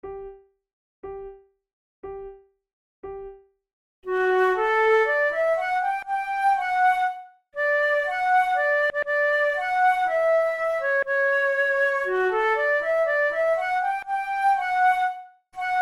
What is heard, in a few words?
duet